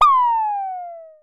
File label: Synare Filter; Star Instruments Synare 3